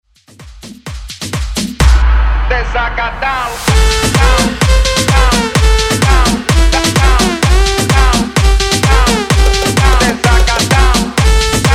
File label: Hype Club Rework 128Bpm